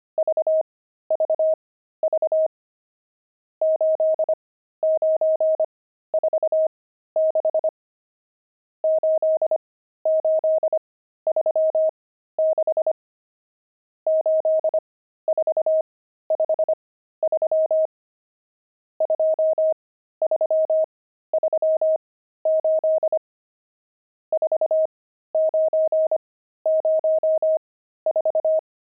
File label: pangram, intermediate pangrams files